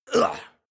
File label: male fight soft; menschen; schreie